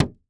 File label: Foley Table Fist Hits Wood Table